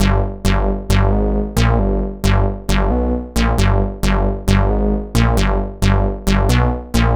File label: synth, Hardcore Breakbeat 134 F#min; Big Fish Audio; Hardcore Breakbeat Action; Trancefunk Mutator